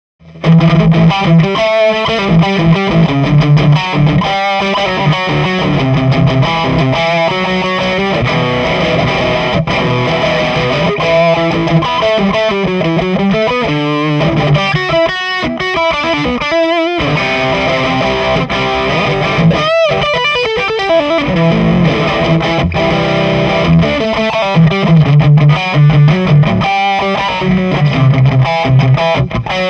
tele bridge distortion, pickups

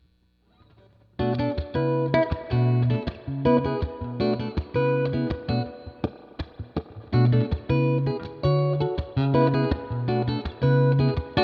Epic 1955 Middle Bridge Jazzy, pickups; Klein

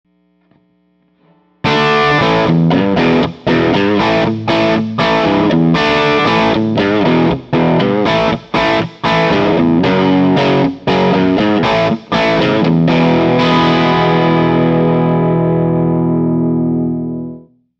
52 Rock, pickups

